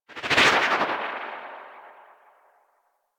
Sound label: Arch Wing Atmosphere Flyby Left, Vehicles; Movement; Warframe